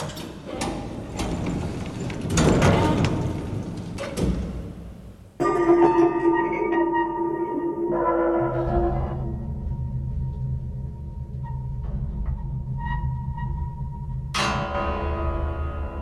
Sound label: elektronica non grata